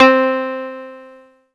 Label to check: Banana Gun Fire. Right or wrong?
Wrong